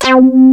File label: SPLIT; Rubber Chicken Software Sample Bank